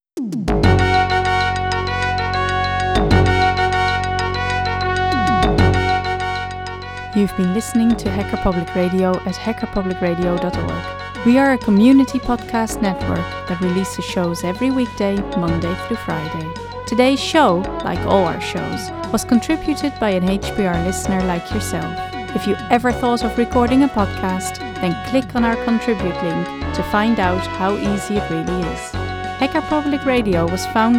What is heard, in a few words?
outro mixed mono